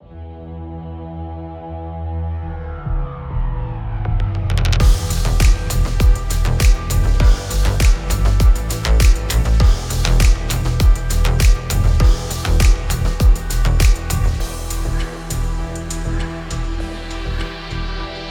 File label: mutation electronic